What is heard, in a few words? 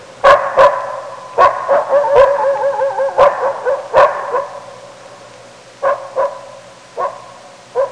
Wolf Pup Bark Howl Ambience